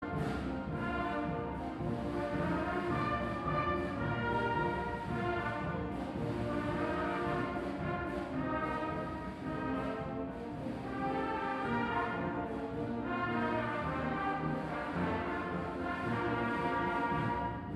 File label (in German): Blaesergruppe Big Band; Musikdarbietungen bei der Kunstnacht